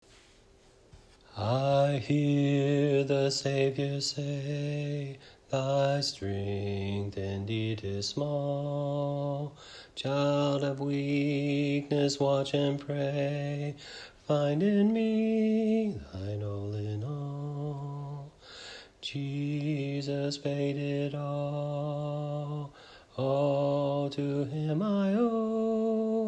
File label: in Db BASS